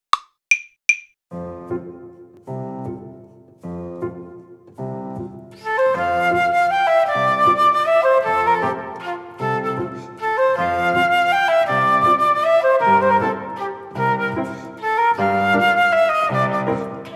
Delibes Waltz Performance Track

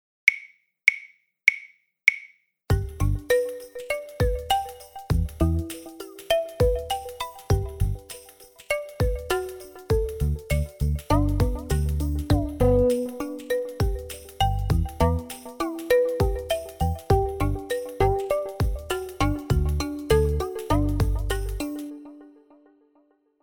Backing Track

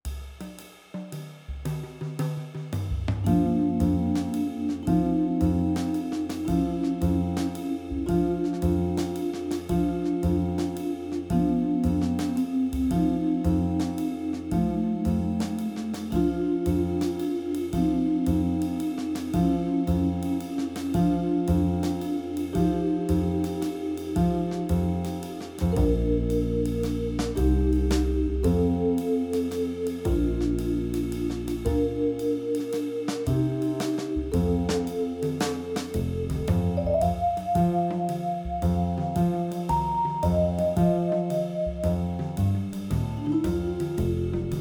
Jazz Waltz Backing

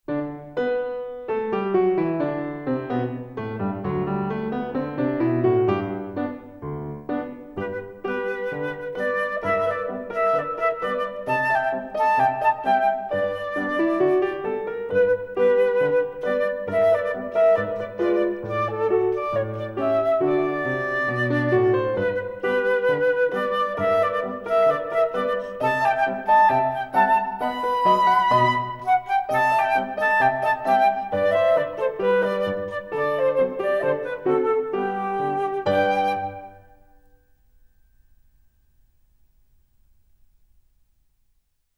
performance, Flute; Joplin; ABRSM

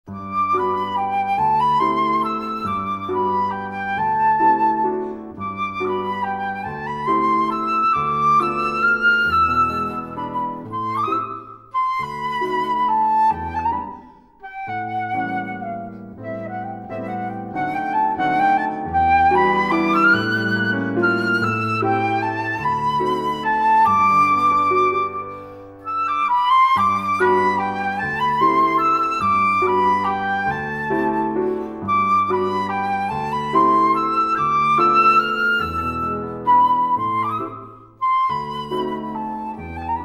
performance, Flute; Arnold; ABRSM

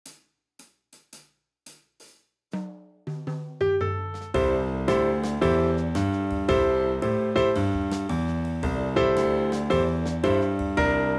Walking Bass

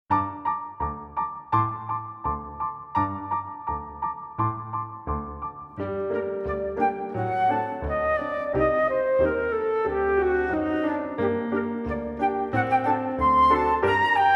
tango

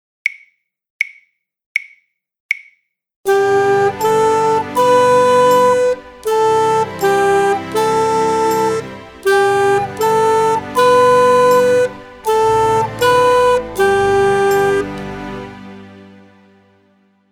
Full Performance

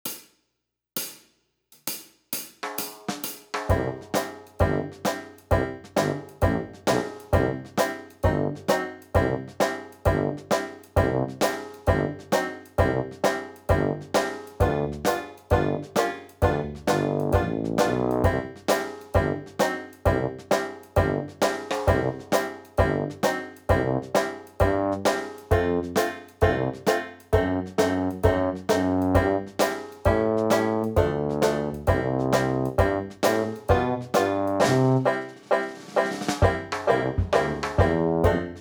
Dixieland backing

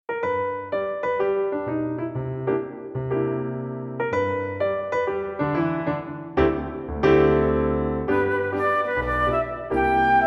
flute

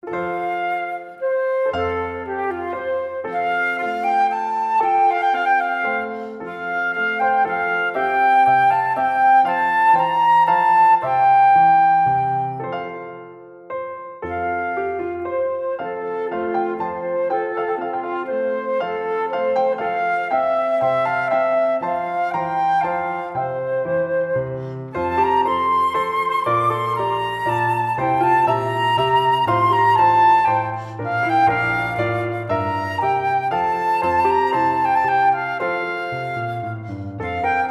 menuet; handel menuet ii from royal fireworks music hwv 351

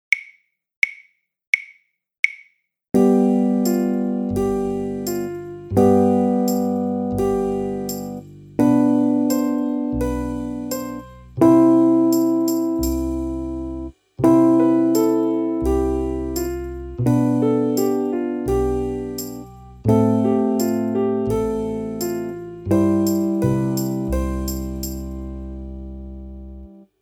C Freeze Backing Track

